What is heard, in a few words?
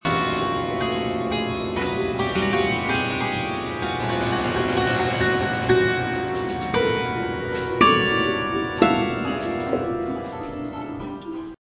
hearing the piano being eaten; storymaker music workshop